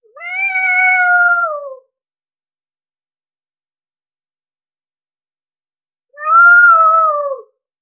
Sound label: high pitched playful meow; pet